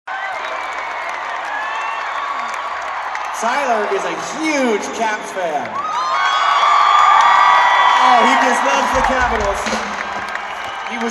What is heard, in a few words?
Riff